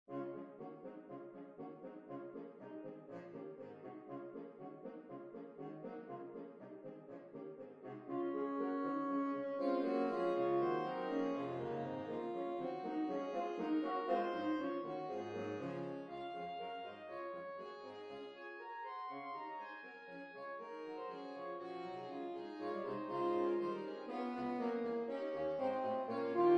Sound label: Sax Ensemble; Grainger; Handel In The Strand